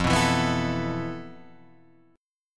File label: F Major 7th Flat 5th, F, down, Guitar, Drop D